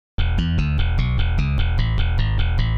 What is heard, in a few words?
bass